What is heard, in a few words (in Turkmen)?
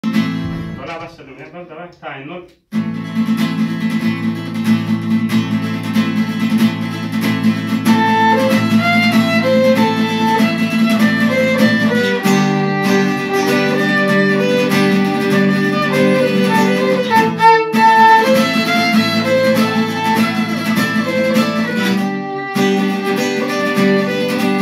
lezginka gitara skripka; turkmen